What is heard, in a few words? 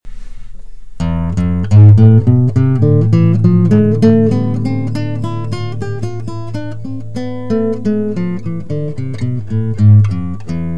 spanish scale, classical guitar